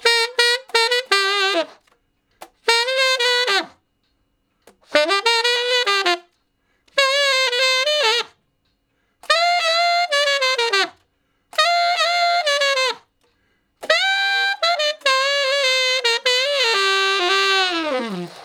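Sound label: Tenor Sax Shuffle (G); Crimson Blue and Fabulous Horncraft 4 RnB; Horncraft for R&B; Solos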